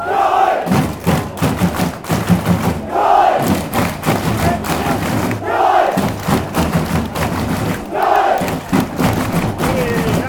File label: drums